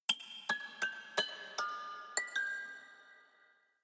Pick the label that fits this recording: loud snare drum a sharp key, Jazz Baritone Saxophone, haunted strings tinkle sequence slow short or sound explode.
haunted strings tinkle sequence slow short